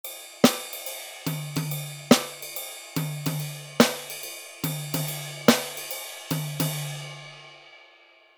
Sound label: swing example drummer